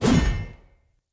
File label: Sphere Polearm Whoosh Heavy; Weapons; Player